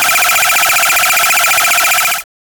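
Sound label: satisfying laser shooting, 16-bit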